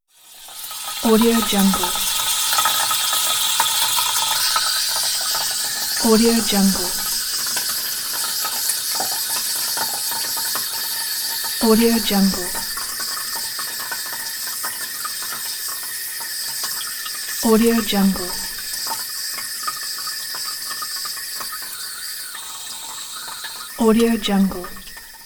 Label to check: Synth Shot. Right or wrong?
Wrong